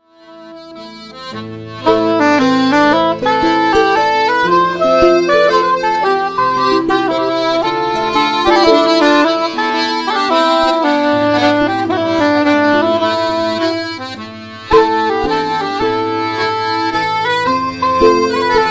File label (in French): mazurka lente; Folk; Danse